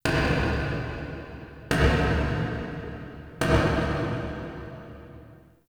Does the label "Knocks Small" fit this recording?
Yes